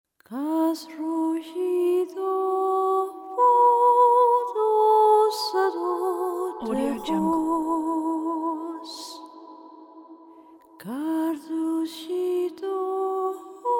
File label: awakening a cappella